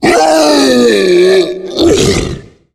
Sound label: tank death, zombie; Left 4 Fortress